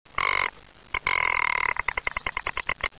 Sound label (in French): lagopede